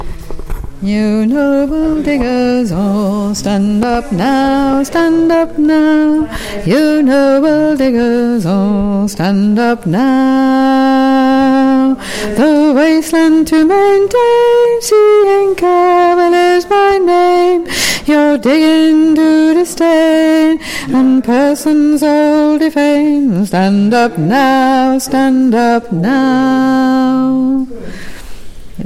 Alto Bass; Diggers

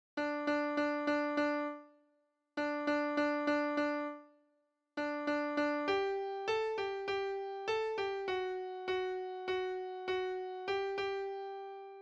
Midi alto; bella ciao we are singing

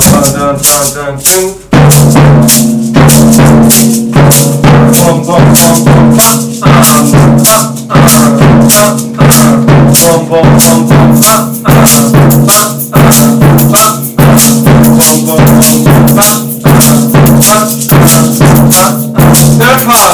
samba big Drum

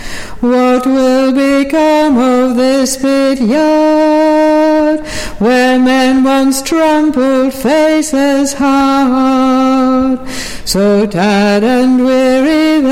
Harmony; Coal Not Dole

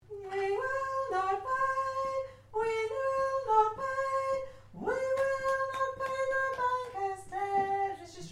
Tenor

